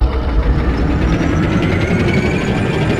tank start

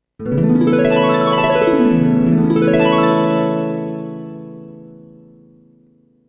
Jingle, Clear Harp; Magic Sudoku Numpla Oekaki Puzzle; psp

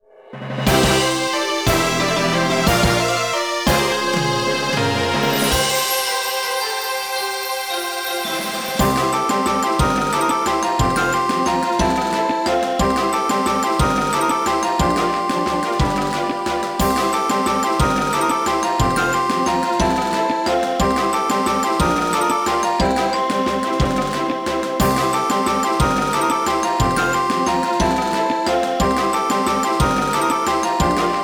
Quest Success; suikoden tierkreis; ds

